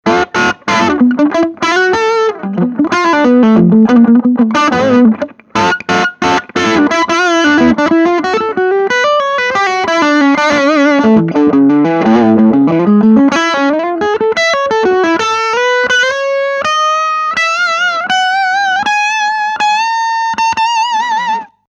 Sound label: Crunchy Solo